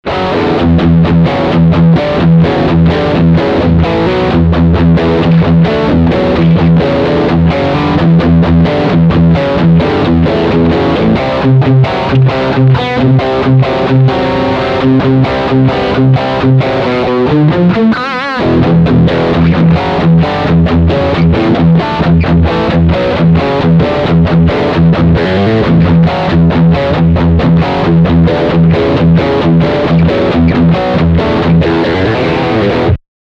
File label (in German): Power Riff Steghumbucker